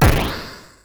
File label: SFX, CSG8580R5; Narco Police